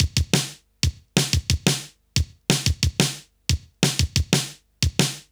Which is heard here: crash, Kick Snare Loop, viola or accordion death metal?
Kick Snare Loop